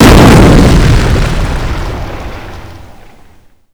small explosion; WAR

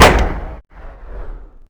m3 grease gun; WAR

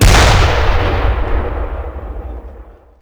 mortar fire 80mm; WAR

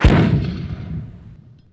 60mm mortar; WAR

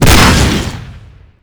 sherman engine destroy; WAR

